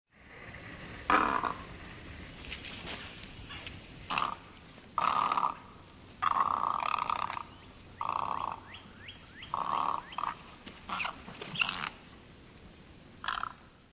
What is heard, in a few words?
Great Egret